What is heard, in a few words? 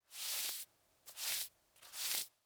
Hand Rubbing Shirt; foley